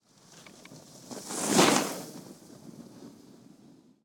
snowboard snowboarding passby driveby right to left; fvtt sonniss gdc audio